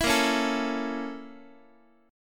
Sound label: A sharp Minor Major 7th Flat 5th A sharp Guitar; A#mM7b5 strummed; JGuitar